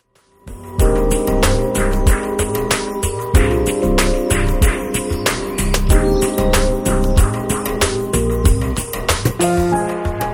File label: Heart Beat